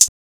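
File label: hi-hat, closed hats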